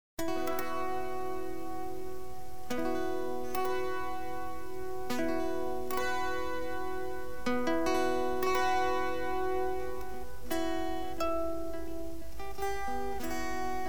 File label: instrumental